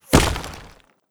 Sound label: concrete impact bullet; Rust